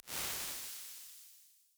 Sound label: Sillica Beads, Lexicon 480L Reverb; Big Gee's